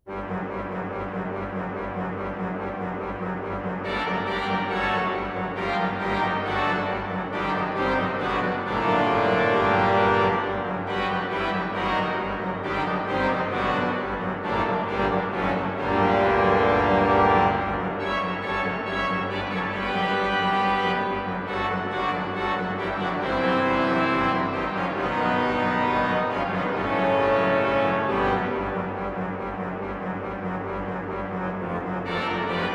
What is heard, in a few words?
toccatina, organist; Andreas Willscher